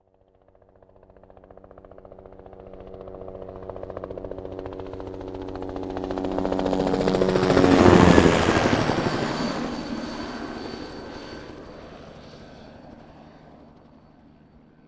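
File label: ambient helicopter; Emergency Fire Response; Missions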